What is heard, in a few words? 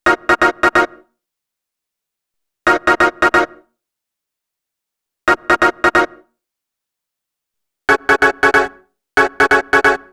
E-Coast HipHop Chord Hit Ebmin, Synth; Digidesign; Pro Tools Essential Loops